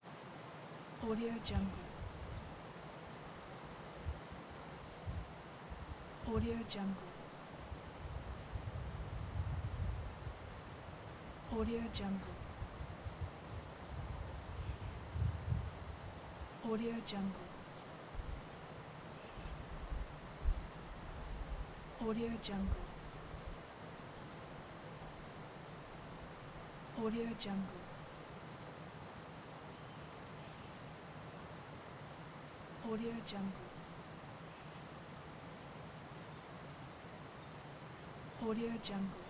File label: forest ambience with cicadas